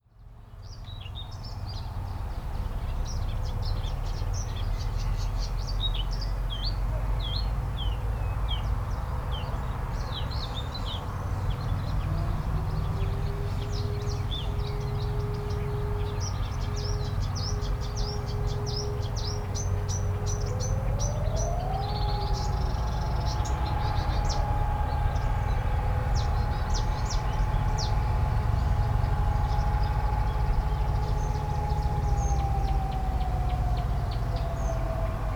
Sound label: ambience